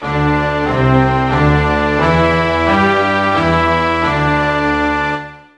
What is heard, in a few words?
Japan, Fanfare; 1997 MMX LAN PACK (win 95); Worms Armageddon